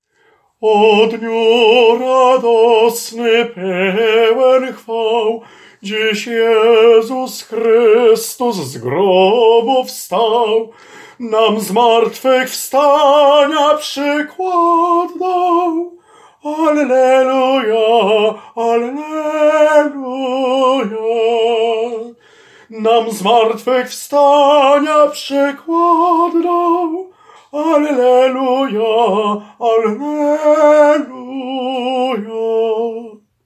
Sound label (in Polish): O dniu radosny TENOR